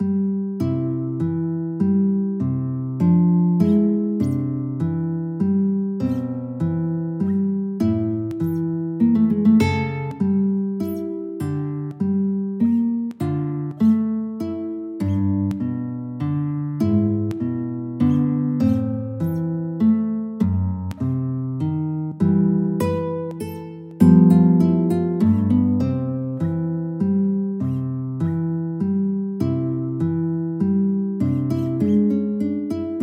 Noct guitar

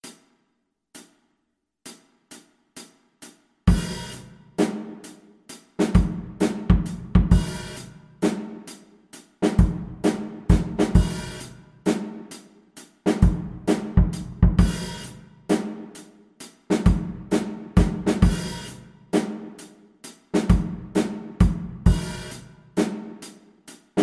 Funk Study, bass guitar

